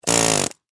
Industry Air Hammer Operate Short; Hammers; Tools

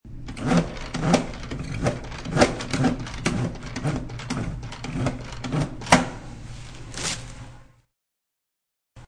Pulling paper towel from a dispenser.; Classroom (Sound Effects:Schools)

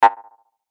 CLICK SYNTH BLIP DELAY; Blastwave

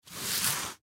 Tape Slide Scrape; Office; Blastwave

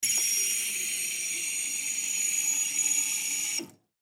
Sink Run Water Short; Public Bathroom; INDUSTRY; Household

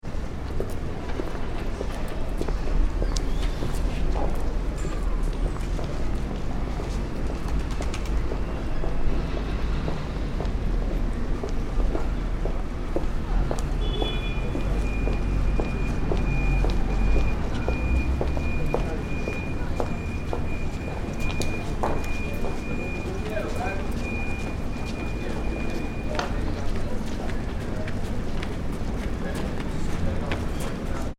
ambience urban city pedestrians quiet; City Or Town Street Ambience - Pedestrians Walking With Some Traffic Noise In Background; McKinneySound

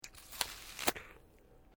Male Eating Apple Bite; Male Vocal sounds; Human

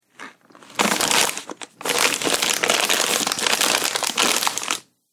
Crunching Potato Chip Bag; Eating Sound Effects

